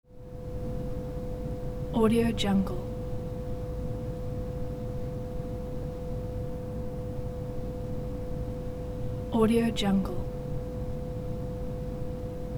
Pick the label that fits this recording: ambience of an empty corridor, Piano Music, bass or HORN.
ambience of an empty corridor